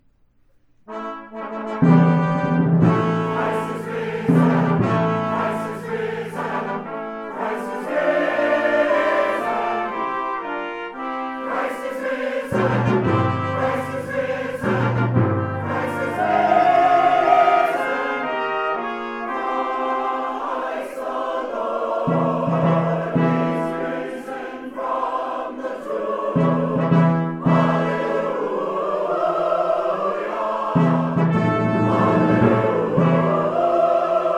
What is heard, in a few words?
Easter Fanfare